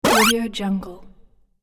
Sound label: great retro power up